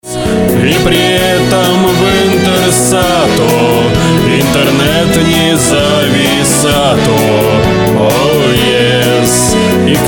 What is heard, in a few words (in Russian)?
vokal